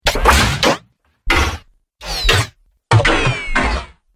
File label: Sci Fi Impact Collection; Tech Warrior